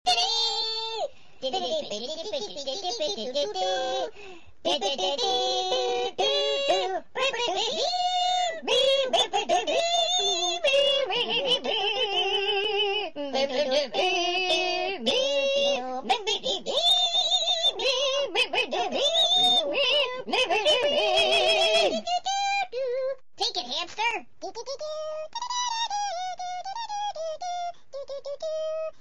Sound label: Chipmunks